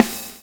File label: SWNG